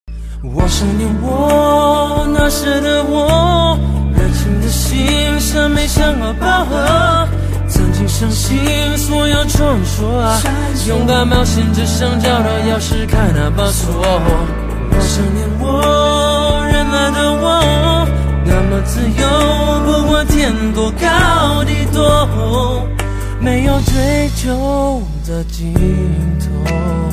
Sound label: Tension